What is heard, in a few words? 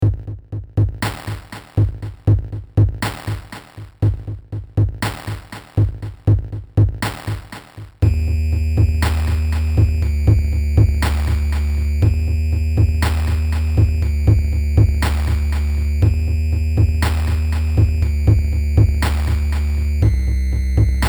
cool fakebit lead; weeklybeats-2016